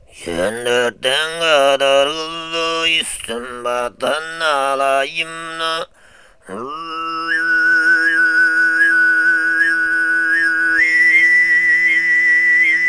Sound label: kosygyt, khoomei